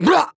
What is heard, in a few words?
Death; Darklord; Paladins